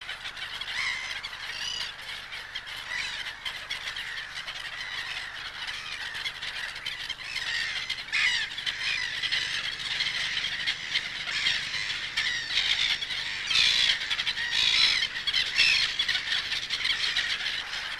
gaviota capucho cafe voz; aves

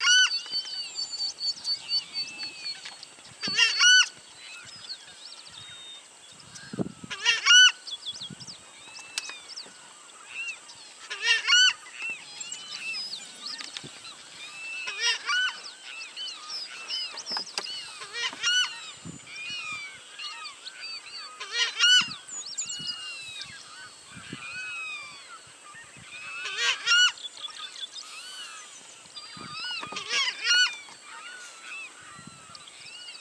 Voz Chajá; aves

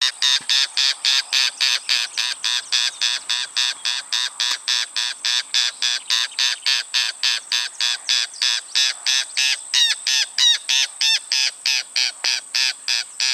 jacana voz; aves

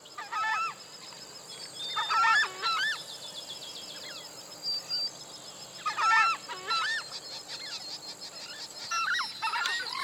Voz Chajá; aves

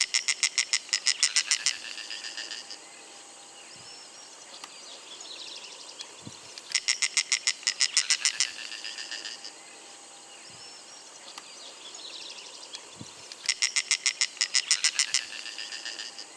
Caracolero; aves

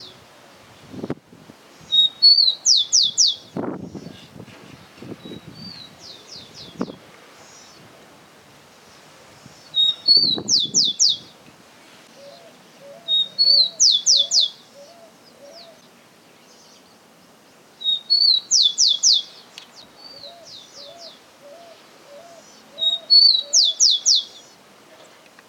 chingolo voz; aves

